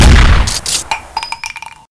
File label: Cool Sms Shot